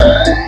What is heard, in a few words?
NOISE; USB Soundscan vol.27